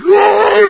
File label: zombie voice idle; Source Engine; video game; npc